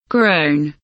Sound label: groan